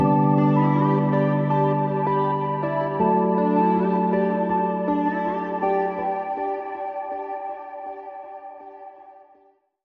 Link Sting

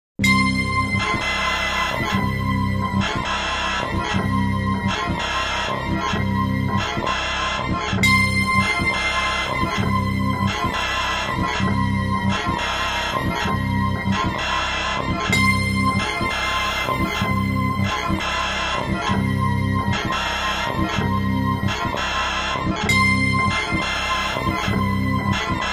Machine Like Evil

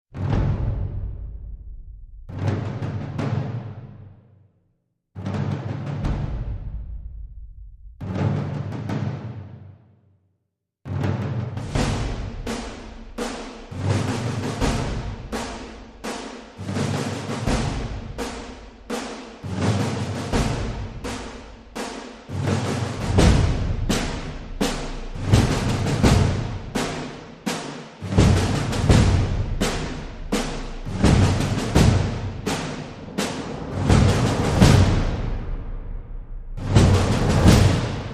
Drums Militia

